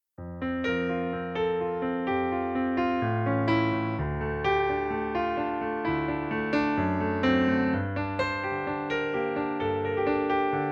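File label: piano playback F major; F-Dur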